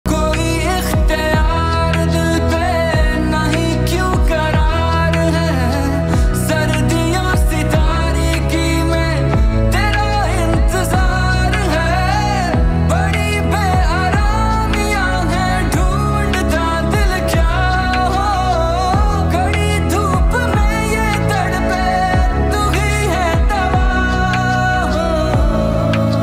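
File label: original soundtrack pakistani ringtone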